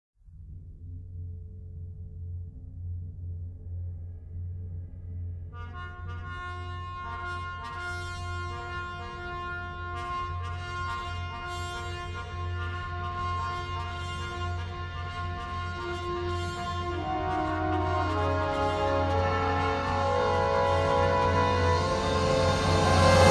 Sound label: Drums of Thunder